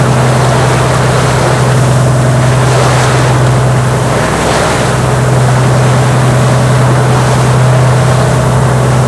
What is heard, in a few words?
boat engine; War Thunder; engines